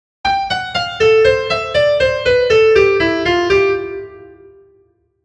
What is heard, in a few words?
major251